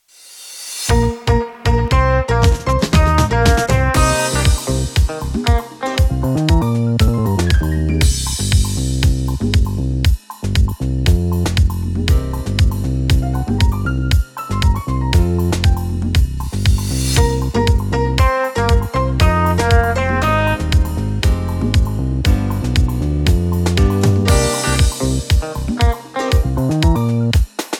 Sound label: Logo Long; logo sonore spot pub audio branding